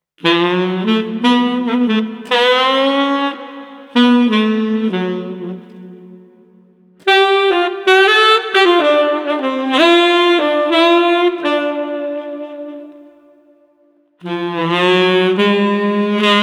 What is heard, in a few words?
Tenor Sax Soothing 120